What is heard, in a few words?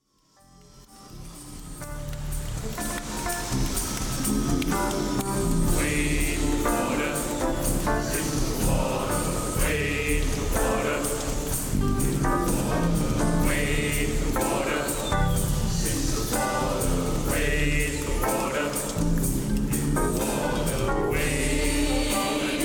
Wade Water, gospel